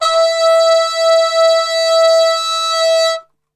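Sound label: E 3 trumpet cup mute; Best Service; Brass Super Section; TRP CUP MUTE; 90 s Sample CDs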